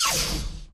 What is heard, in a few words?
bullet generic titan shield scatter; Titanfall 2